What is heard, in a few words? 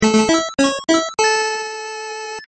Success